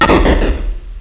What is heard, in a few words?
SNARE HIT; RpgMaker